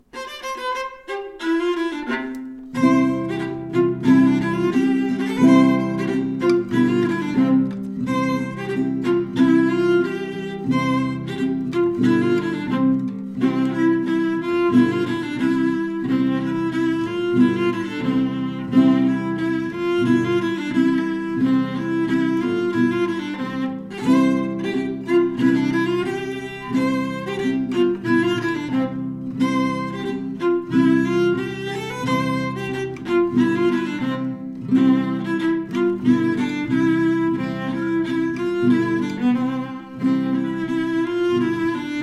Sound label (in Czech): doprovod kytara; koledy